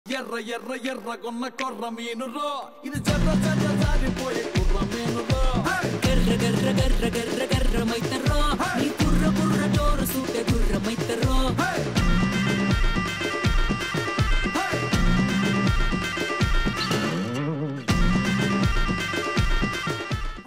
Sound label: slowed ringtone